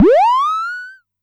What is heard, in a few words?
Amdek Perc; techno drum fx; Analogue DrumHits